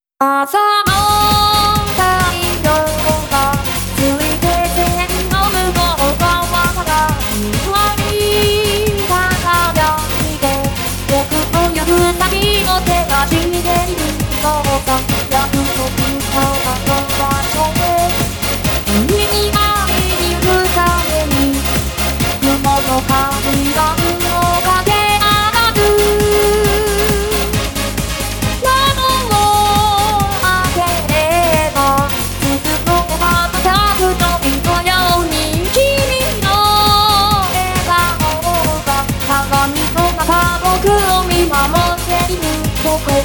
low key

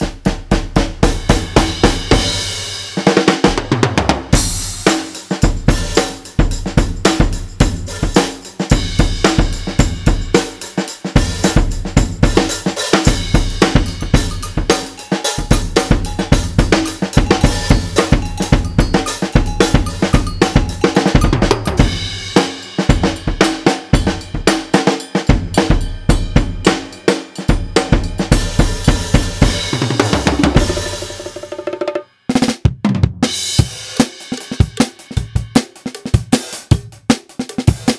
Slap Them Skins Drum

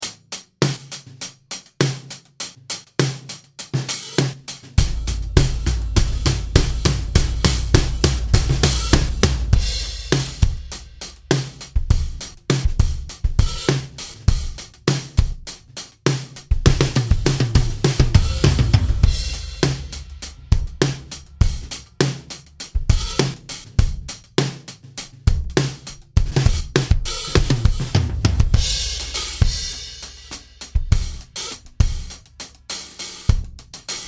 drum ear

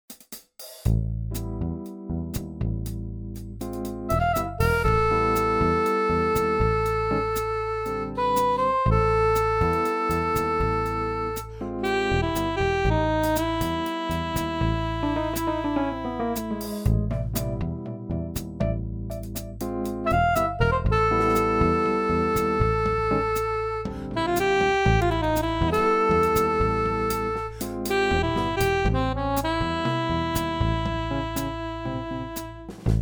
JAZZ & BIG BAND

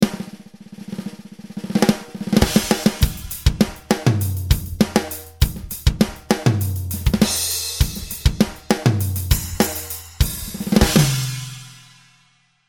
Small Amb Rock; Sonic Implants

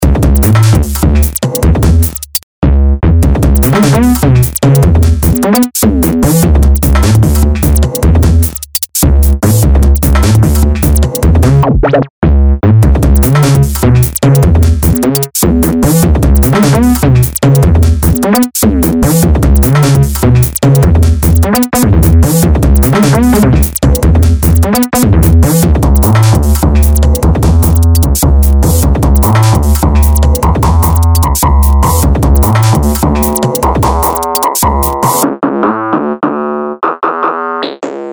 sticky thump

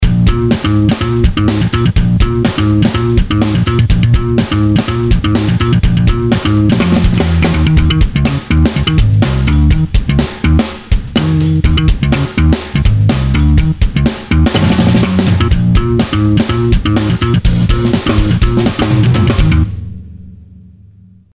slap

